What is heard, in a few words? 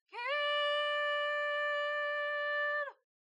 Kulning; bramble the mountain king; ps4 ps5 switch windows xbox one xbox series xs